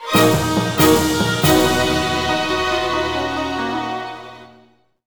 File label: Combat End Jingle; legend of kay ps2